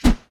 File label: flip; Ringling Bros. and Barnum & Bailey; Wii